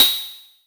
Noise

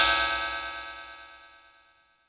Cymbal FX

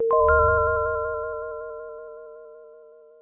SYNTH Pure Tones Anouncement

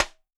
Rim Acoustic Smack

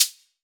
HAT

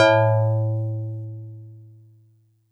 Piano DX100 A2; Star Piano

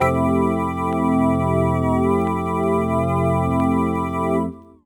M1 Organ Wobble Vinyl Synths Fmaj9 C2; vinyl chords

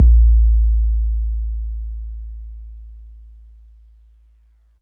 BD 808 A; MPC60

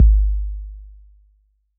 BD 808 Decay

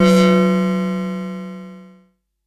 SYNTH

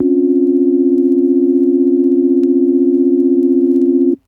Polysix Classic Vinyl Synths A#1; vinyl chords

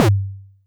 Tom; TRX

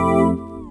M1 Organ Stab Vinyl Synths Fmaj9 C2; vinyl chords

